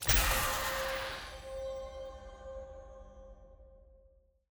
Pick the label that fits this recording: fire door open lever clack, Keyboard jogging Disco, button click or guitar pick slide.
button click